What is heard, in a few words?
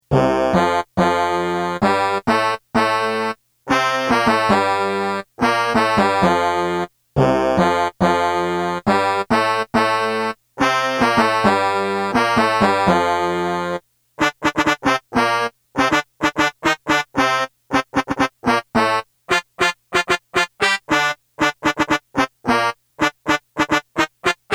melody